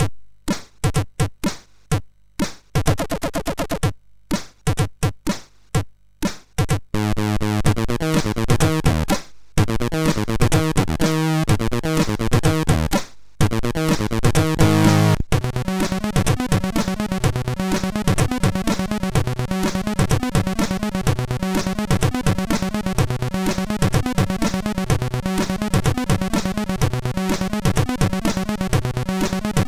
sid MOS6581; MOS6581R4

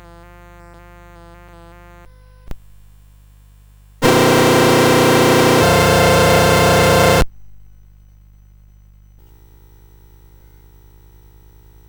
Chords, CSG8580R5

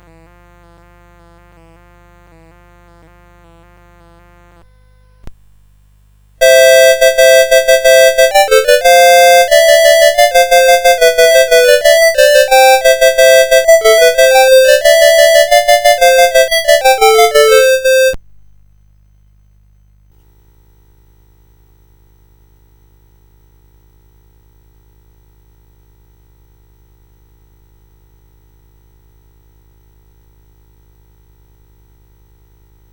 Jingle Bells, CSG8580R5

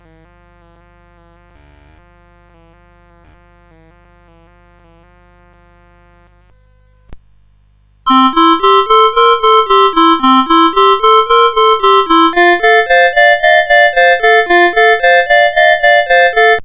Boogie, CSG8580R5

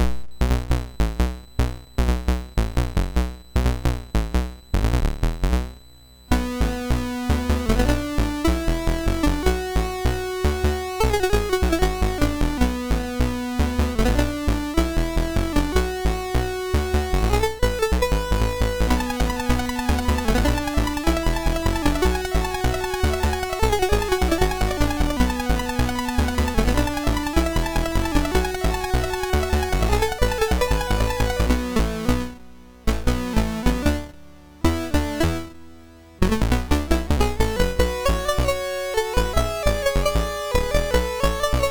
MOS6581R2, sid; Pocket Rockets

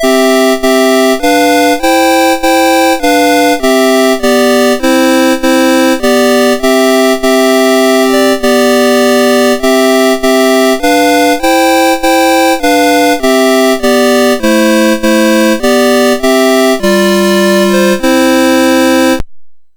Ode to Joy, MOS6581R2

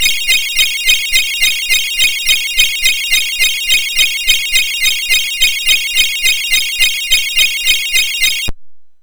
Sleigh Bell Sounds, MOS6581

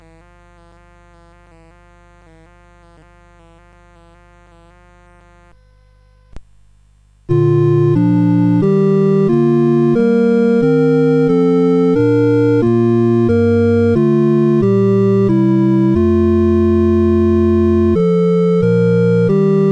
St Anne Hymn, CSG8580R5; St Anne Hymn No289

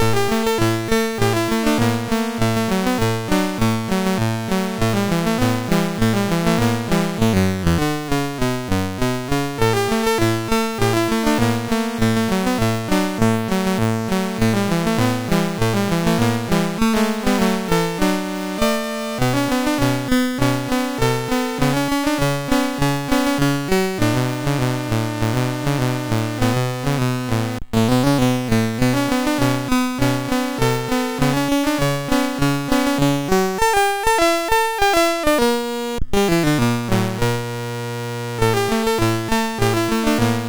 MOS6581R3, sid; Mouse Trap

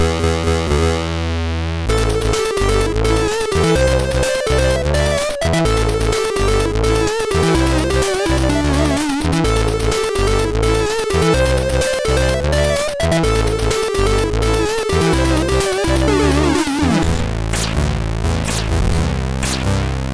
Horse tune, MOS6581R3; Horse-Demo tune 1

